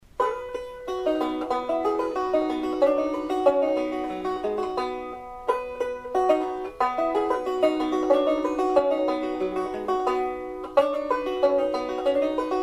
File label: Banjo